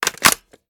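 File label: reload fast mag in, shotgun; reloads; iw8; romeo870; gmod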